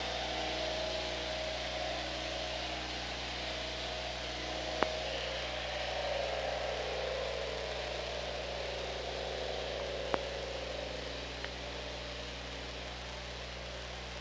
OrcaLab

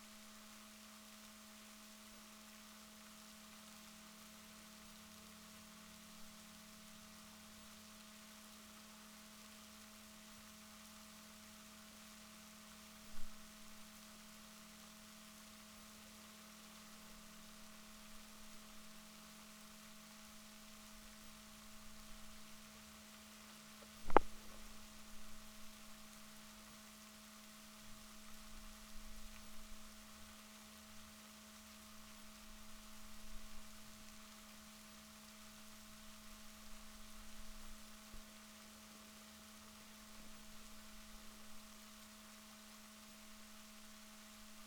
rorquals positif, Balaenoptera Physalus

